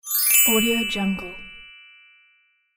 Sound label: magic chimes